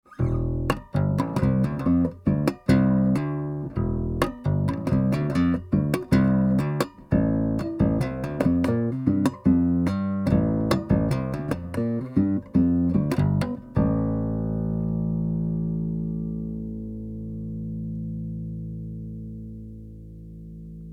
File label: Bass Guitar One Mic; Manufacturer Audio